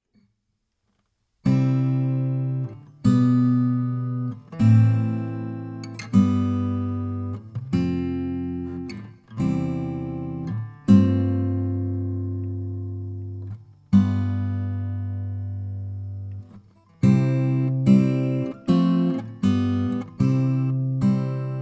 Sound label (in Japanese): ギター伴奏のみ